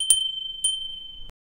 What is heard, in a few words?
a short and subtle sound, wedding